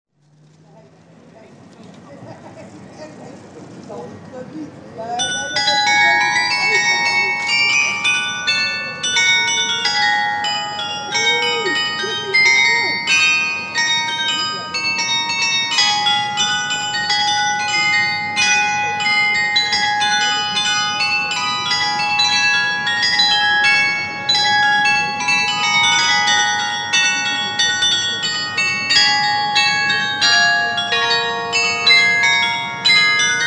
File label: carillon